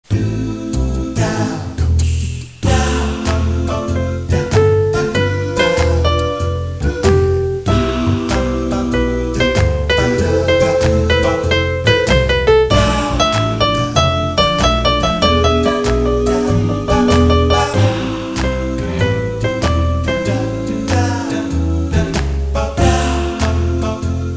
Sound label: Jazzy Melody Roland Piano; 16 bars of jazzy melody